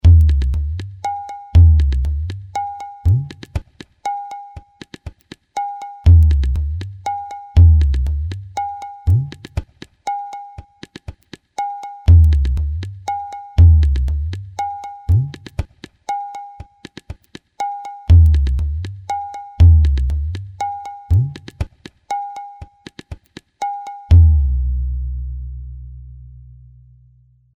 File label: bangla ektal